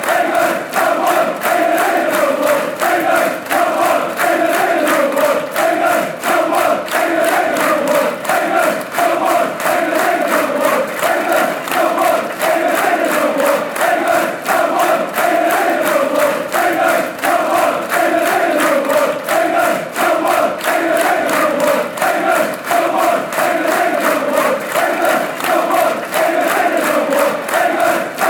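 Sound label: fanchants, football chants; englands number one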